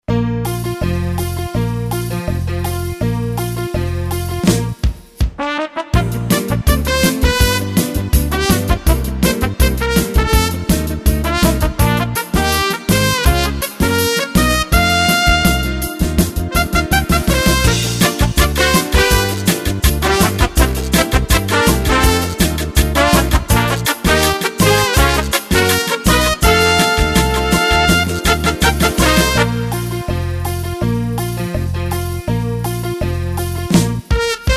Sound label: 70s Big Band